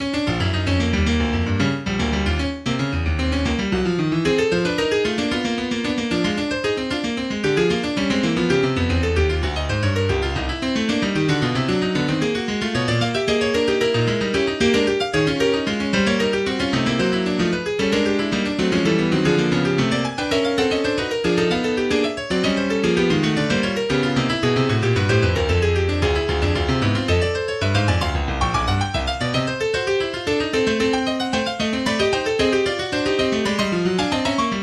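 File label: Fugue No 5 in Bflat, MIDI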